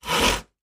handsaw stroke

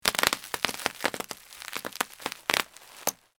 Bubble Wrap Popping

